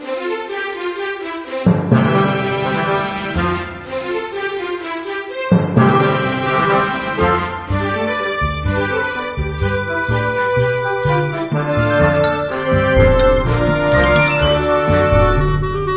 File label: Menu; Mic Menu Toy Story; Pixar Pals; Leapster Explorer